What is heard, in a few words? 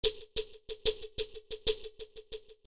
perc